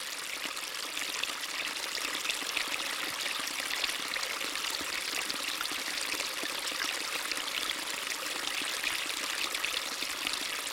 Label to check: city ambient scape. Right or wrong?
Wrong